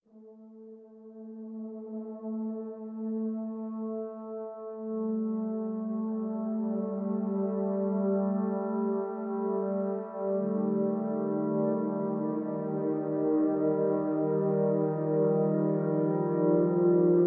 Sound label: French horn bg loop; The Detail ios linux macos windows gamerip 2014